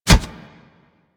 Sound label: Kubrow Step Metal, Footsteps; Enemies; Warframe